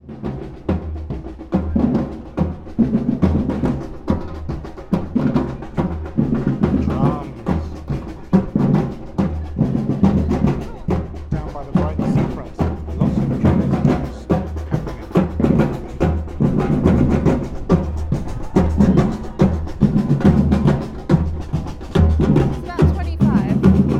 Drums In Brighton

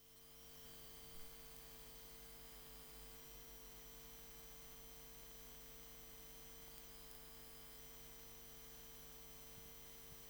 Synology DS216j Boot; EM Pickup

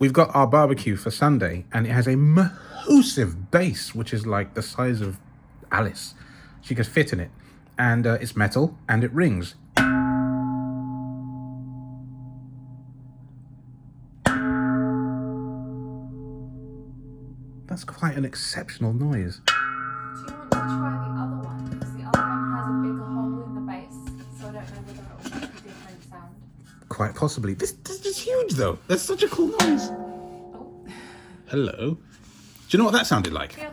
Barbecue Bongs

